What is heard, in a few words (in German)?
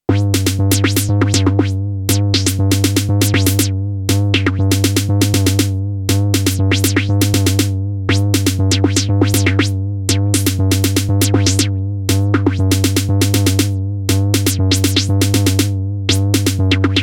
Elektron Sequenzer Parameter Lock LFO auf Pitch und Filter